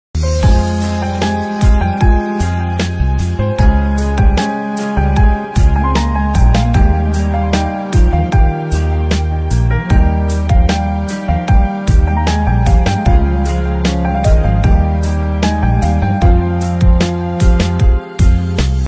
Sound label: sad ringtone